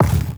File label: stone drop, inventory; ui